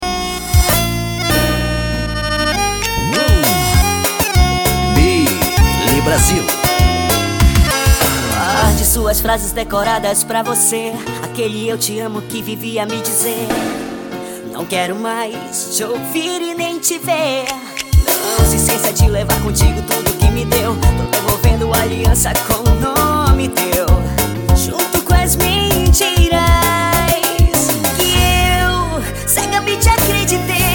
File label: melody